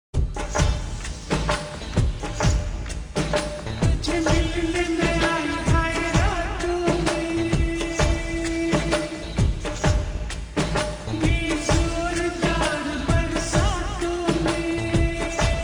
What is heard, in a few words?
Ringtone slowed and reverb